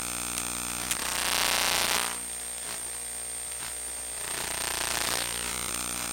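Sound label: ambient hum buzz loop, electric; Half Life Model Pack; Half Life Alyx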